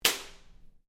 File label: Whip Crack Belt Strap; Foley sounds for melee weapons; Whip Sound Effects; Objects